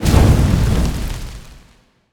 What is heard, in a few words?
Field Mat Tap; Yu-Gi-Oh! Master Duel